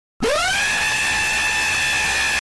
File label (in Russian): Отрыжка киборга; Мемные звуки для Роблокс